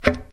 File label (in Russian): klarnet odinochnij korotkij shum